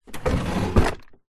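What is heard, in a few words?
household furniture large quality wooden sideboard drawer open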